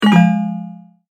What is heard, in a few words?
multimedia tone error